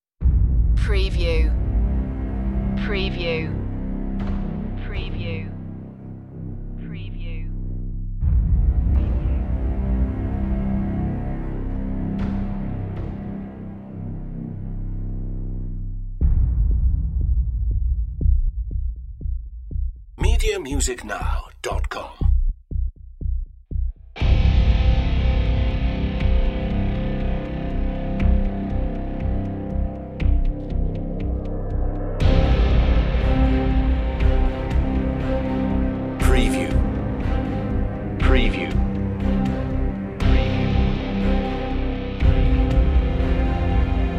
rock mix

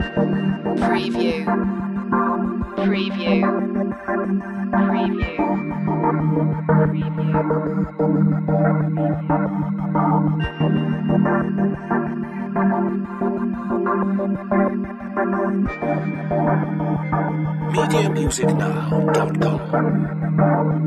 technology progress loop

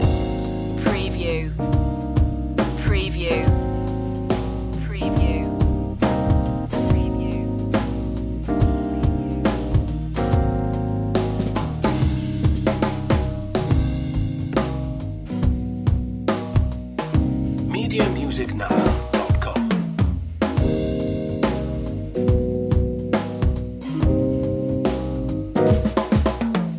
blue funk loop

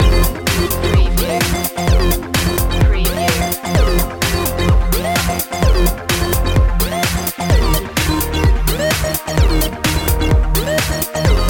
friday night loop

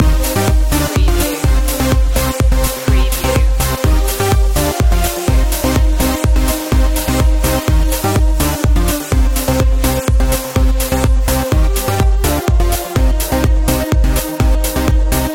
swedish trance loop

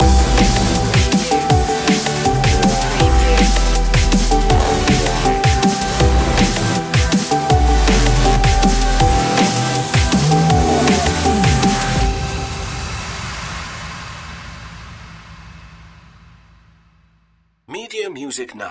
moving up

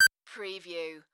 Digital Beep Simple

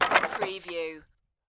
Wood Drop On Wood

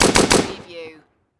Machine Gun Bren

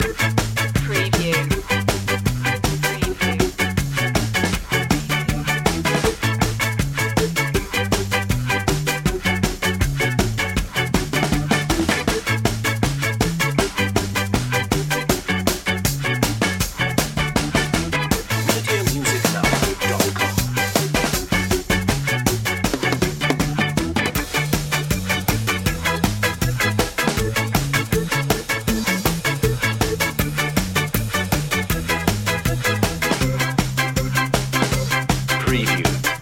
happy ska

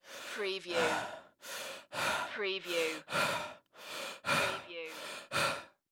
Breath Heavy Male; sound-effects-bible

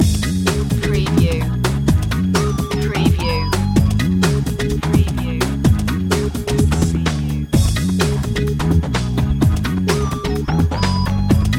funk loop

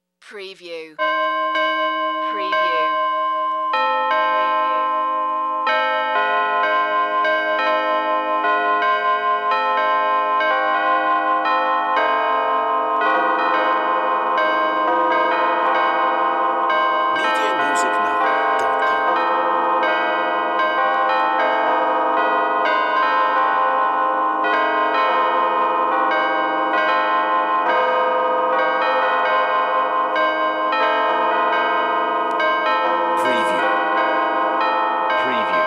Church Bells Peeling

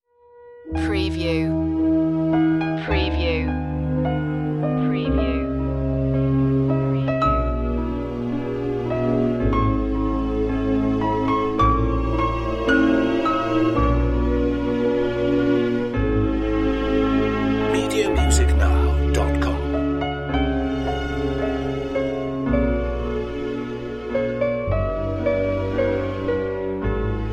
orchestral romance

